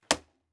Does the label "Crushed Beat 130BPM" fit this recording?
No